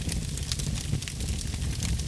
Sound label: fire small